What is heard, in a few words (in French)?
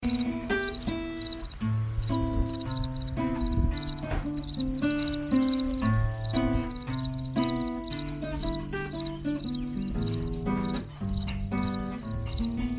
Grillons blues